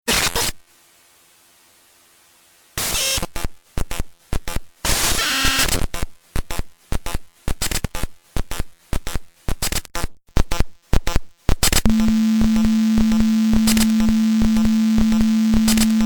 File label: aaaaaaaaaaaaaaaa chop screwd